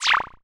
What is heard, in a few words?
Cry